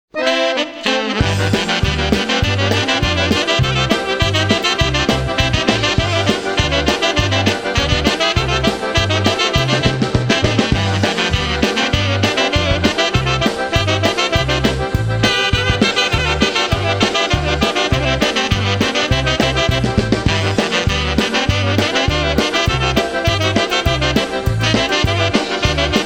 Polka

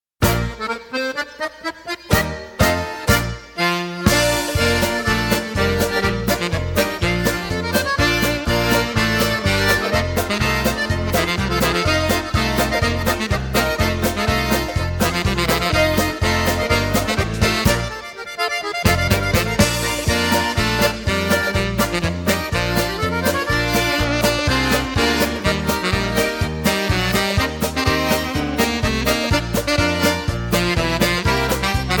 Polka

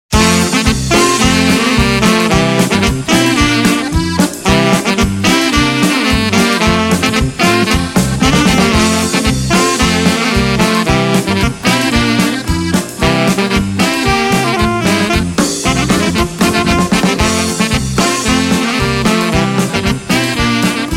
Village Tavern Polka

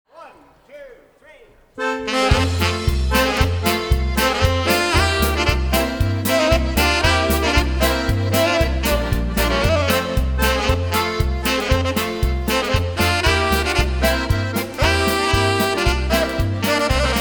Polka

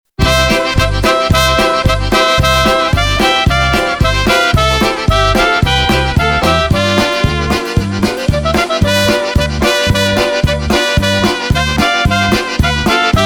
Tick Tock Polka

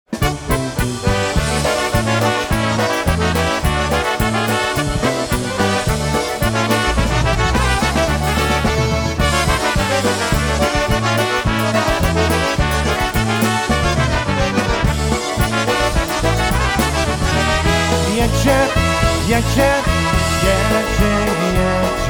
Polka, Live Jam

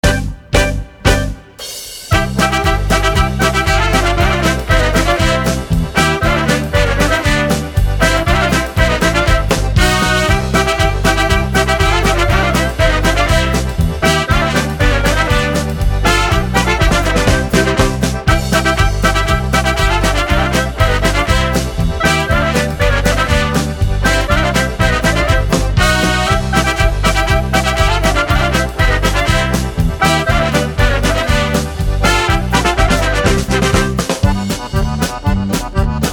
Krakowiak Medley

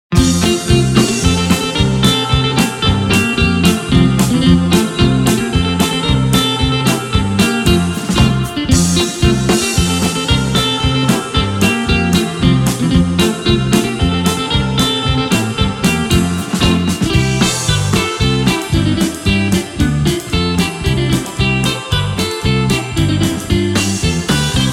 Polka

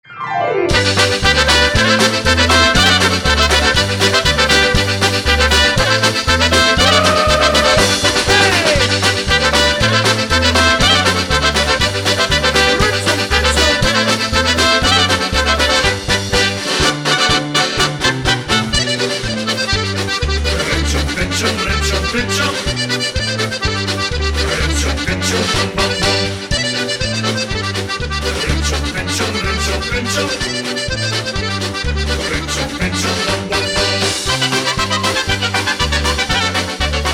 Instrumental Karaoke Version

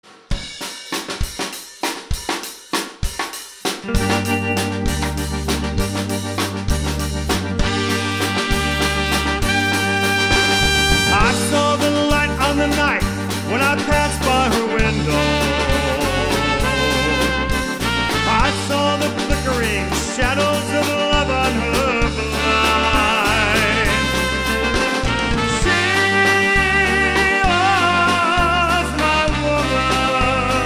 Oberek

